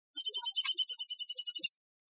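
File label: Downy Woodpecker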